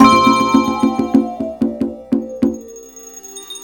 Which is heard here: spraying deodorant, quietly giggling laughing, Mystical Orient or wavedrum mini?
wavedrum mini